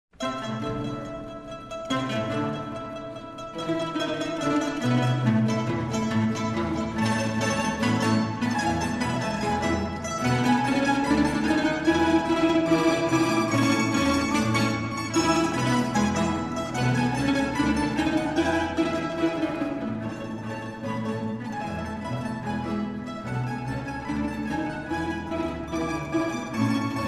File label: old russisch